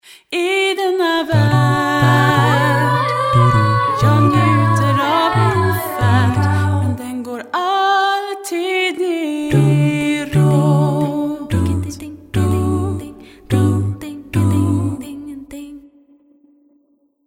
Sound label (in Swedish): musik